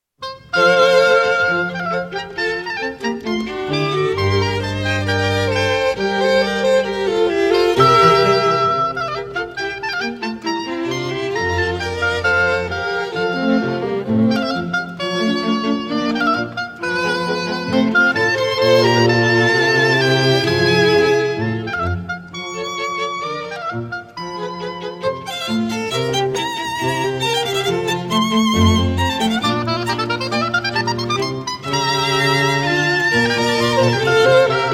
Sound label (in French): quatuor hautbois; Mozart